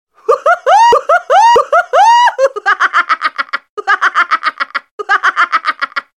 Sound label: funny laugh sms